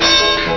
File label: clang